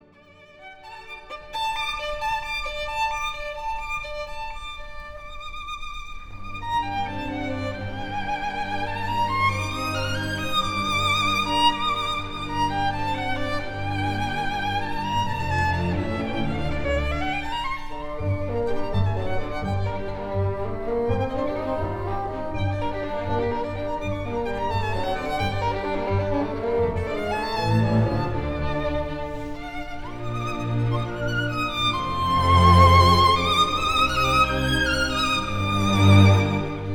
Violin; Beethoven Violin 2; Masur

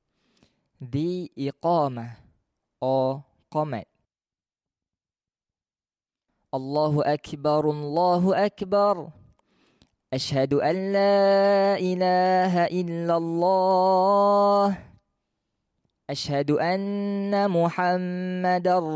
Iqamah

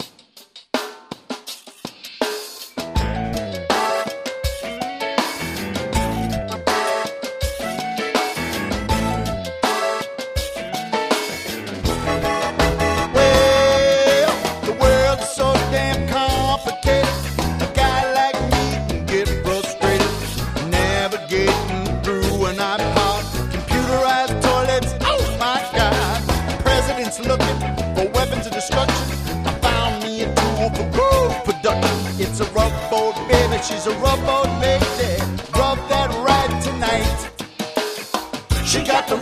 rubboard

